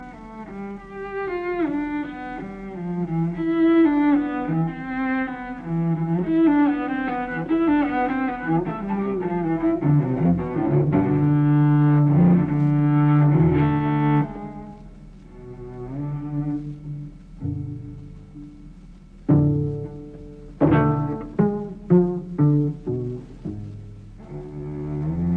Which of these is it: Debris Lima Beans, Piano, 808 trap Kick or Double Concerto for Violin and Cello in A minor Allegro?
Double Concerto for Violin and Cello in A minor Allegro